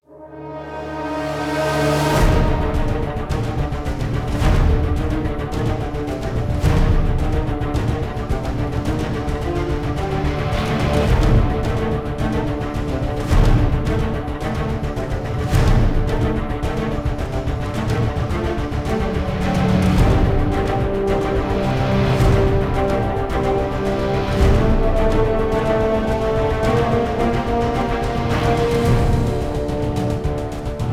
Action Low; the dark knight rises android ios 2012

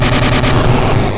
Explosion Hit; Pokemon; Gen 2; attack moves; GSC

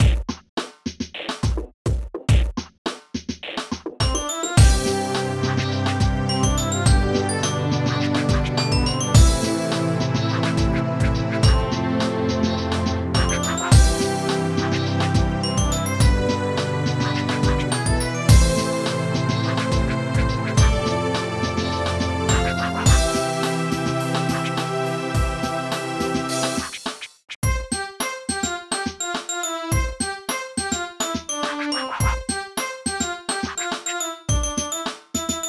BPM105; Scribblenauts

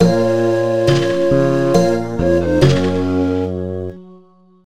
Sting Animal Dies; simanimals africa 2009 nds gamerip

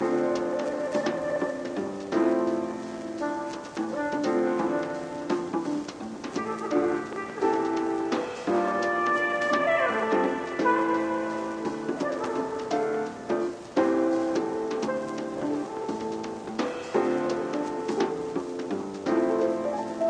Gramophone; ether one redux gamerip 2015

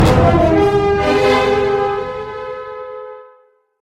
Common Fanfare; Angry Birds Star Wars; android game music

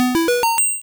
Fly Up; sfx; attack moves; pokemon; gen 1; rby

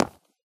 footstep concrete land; ProSoundCollection v1 3 MONO

